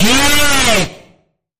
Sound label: Sharp Bend Ahead